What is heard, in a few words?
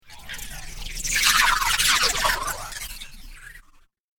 Alien Spirit Menace; Alien Sound Effects; science fiction sound effect